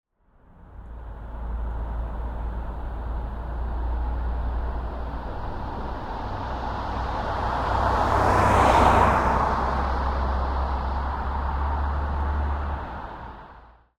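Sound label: Nissan Qashqai I 1.6 exterior, 70kmph passby steady rpm whoosh; stereo XY; Soundholder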